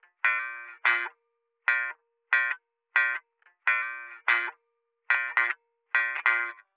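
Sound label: 070 Chill Pop Thin Guitar Bb major, Chill Electric Guitar; Pop Rock; Pro Tools Essential Loops; Digidesign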